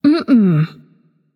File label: disagree light; smite; voicepack; hel